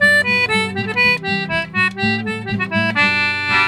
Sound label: Celtic Flavours; USB Soundscan vol.42; AKAI